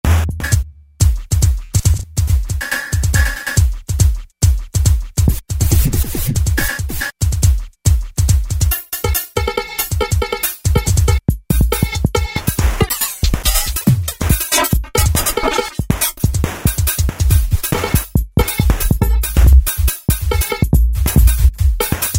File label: Hi Tech & Nz, Roland V-Synth; Factory Patches